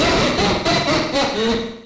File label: laugh; sonic exe 2011x